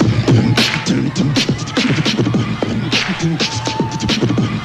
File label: noisy, Beatbox; Drum loops